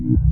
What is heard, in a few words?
health